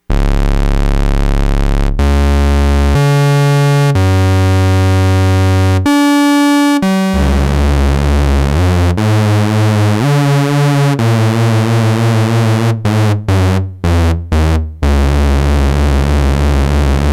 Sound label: TipTop Z3000